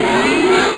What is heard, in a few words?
jump; alienarena